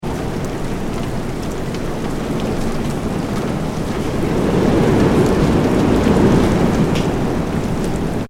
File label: weather wind rain light, Wind (Strong) And Light Rain; Wind And Rain - Strong Stormy Wind With Light Rain; McKinney Sound